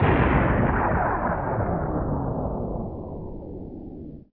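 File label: explosion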